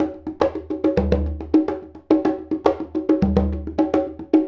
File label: Congas; Essential Percussion